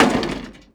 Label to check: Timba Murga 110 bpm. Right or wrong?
Wrong